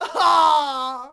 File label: Archer Die; Sid Meier's Civilization 4 Complete; Warlords; Units